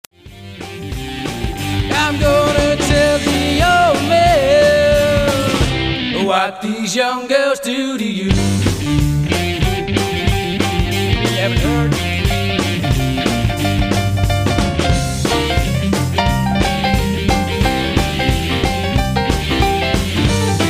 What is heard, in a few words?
Blues Piano Slide